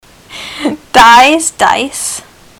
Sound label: dies dice; ontario